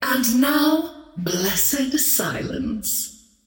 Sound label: scream good; queenofpain